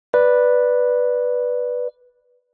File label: unstable, sonification; build-an-atom